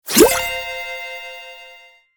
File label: Complex tonal notification